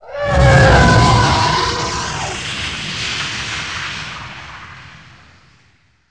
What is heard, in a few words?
skill up